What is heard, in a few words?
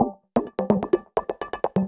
VFX2 Loop 128 BPM